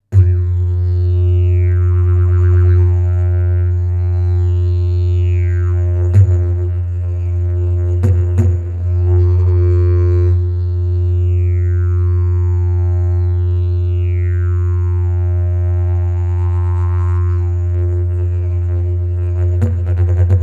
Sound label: didge